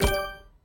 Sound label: tile cascade match; sweet shuffle